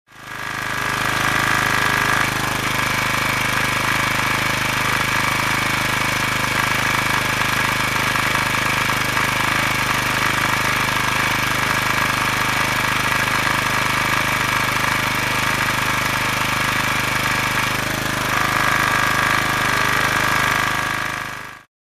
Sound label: Engine running